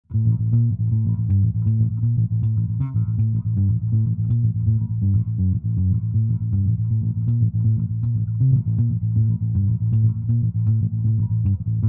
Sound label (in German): Metal Mikrofoniert Rickenbacker